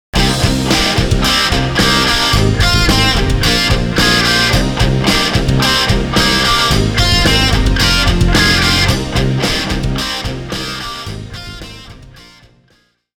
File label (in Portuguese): Riff com excesso de agudos